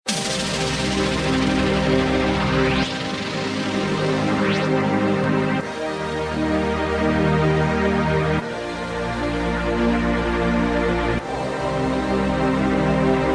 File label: Instrumental